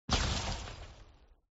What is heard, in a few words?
hit ice; Thunder Fighter